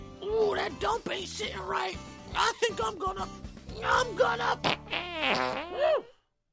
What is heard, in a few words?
mug